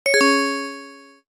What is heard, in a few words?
Notifier Crystal; BlackBerry; OS-4.6